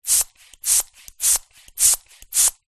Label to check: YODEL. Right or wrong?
Wrong